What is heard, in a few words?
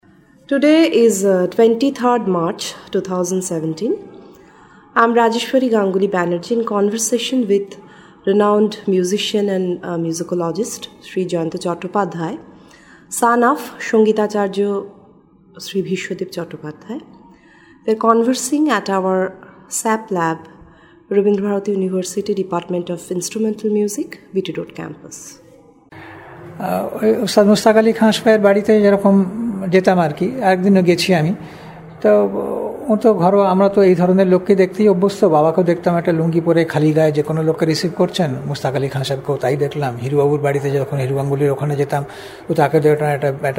Home Music Ambience